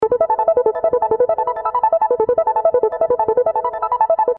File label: spin; myjackpot